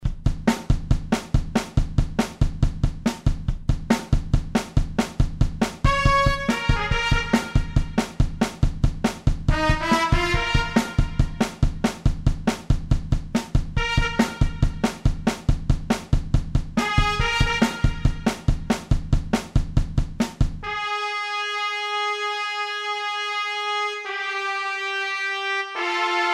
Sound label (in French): Blues trompette